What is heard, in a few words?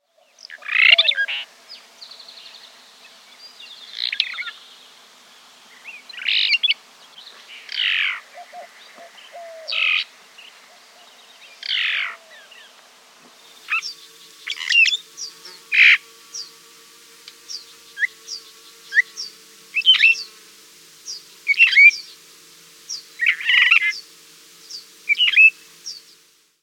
Phainopepla, Birds